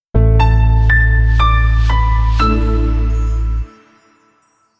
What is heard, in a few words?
jingle; audioflair gmbh